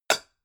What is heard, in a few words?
pan close chink short